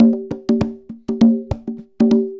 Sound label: Conga Loop (100BPM); percussion; musicradar